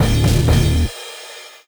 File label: Game Start; A Sound Plan